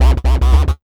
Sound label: ROCK 132 FX, Big Beat Drumloops